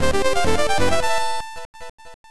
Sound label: victory; Wizardry I II; PC Engine Super CD-ROM2